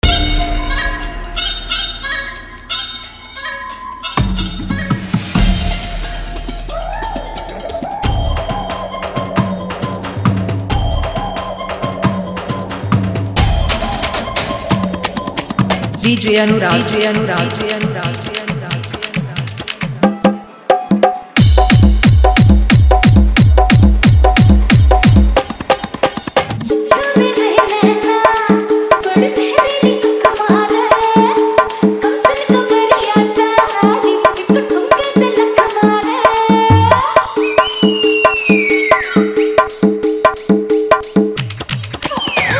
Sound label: Faadu Mix